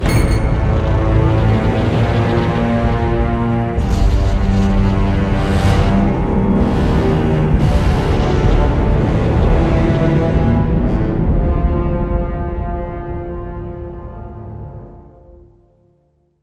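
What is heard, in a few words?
Sting Execution Rooms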